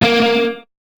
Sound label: Guitar Note